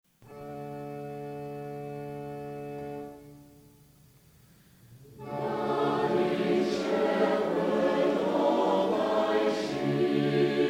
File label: Choir, hymns